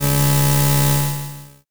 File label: weld